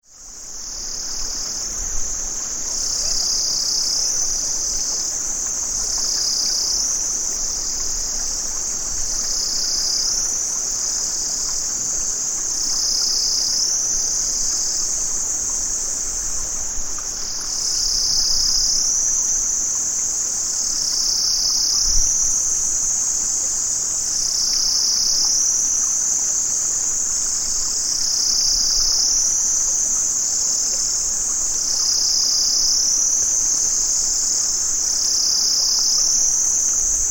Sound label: Cicadas